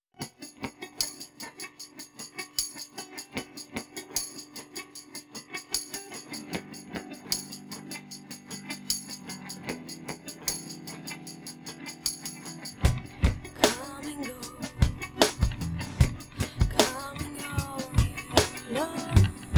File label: percussive